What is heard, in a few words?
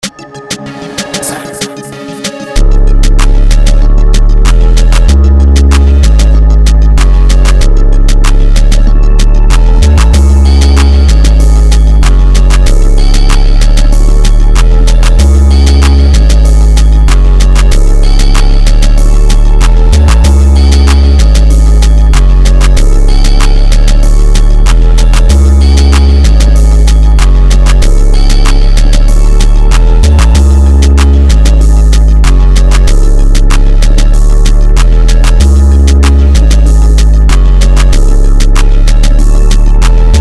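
190bpm C# minor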